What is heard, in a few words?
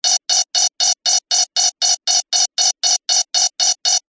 science fiction console alarm